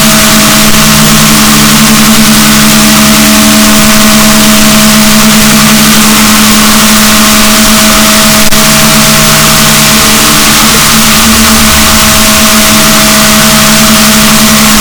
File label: rorquals negatif, boat